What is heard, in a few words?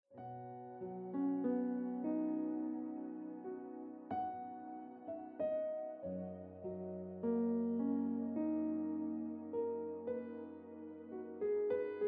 Piano Music